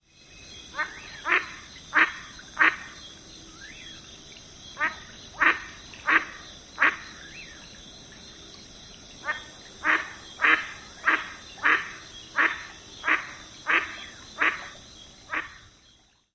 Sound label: quacks of female; TX